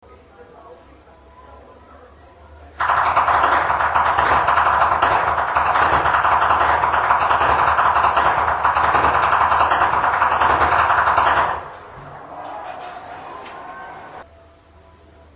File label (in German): schnalzen, flachgau; maxglan